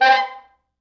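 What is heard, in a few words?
tenor sax noise fx; East West; Quantum Leap Horns Sax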